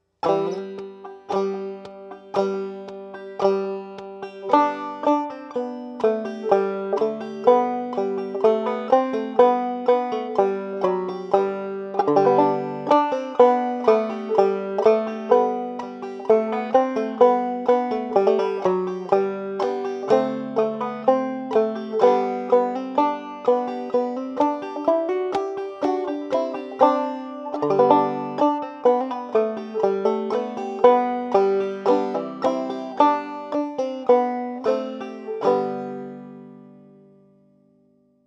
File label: deck slow, banjo; Christmas